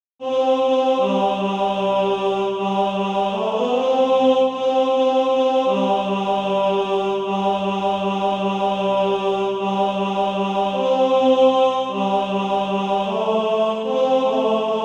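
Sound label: tenor, SATB Practise Music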